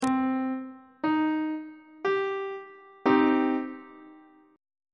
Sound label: d double flat minor chord on treble clef